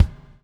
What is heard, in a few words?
BUBL, BUBBLE; Spectrasonics - Backbeat